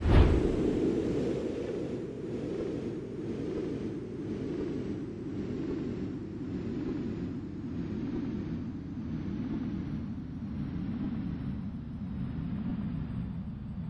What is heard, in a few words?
Wheel Slowing; ClubFusion; Showtime Classic